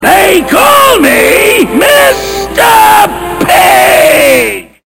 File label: lion